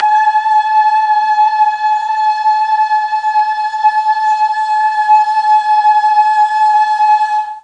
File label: A3 boys choir, mellotron